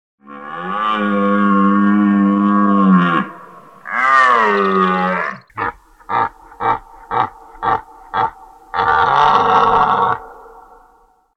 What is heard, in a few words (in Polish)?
jeleń; karty obrazkowe; zwierzeta jesienia